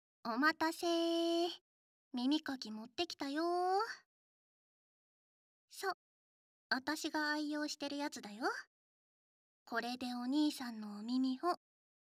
祈の耳かき; 効果音無し

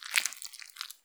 膣内射精

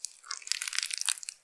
普通, 挿入 or 引き抜かれ終わる直前; ■挿入or引き抜く

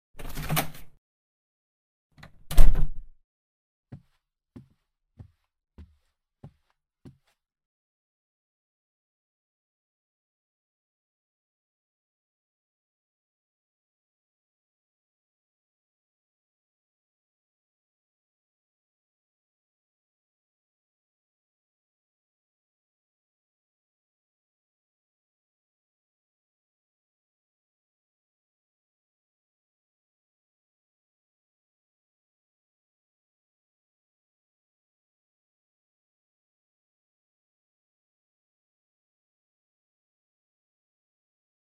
今夜はお団子パーティーだ♪（食事音:団子）ASMR

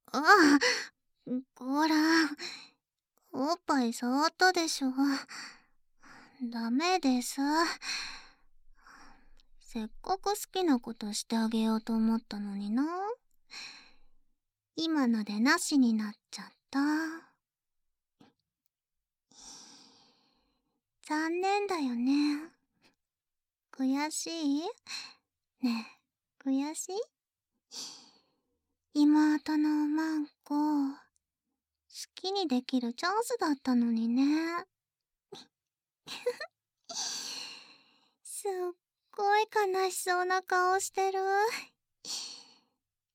バイノーラル えっち

